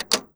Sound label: SWITCH OFF; AKAI Anime Sound FX; SWITCHES